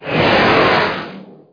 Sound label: force jump; MOTS